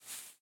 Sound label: Footstep Foliage Grass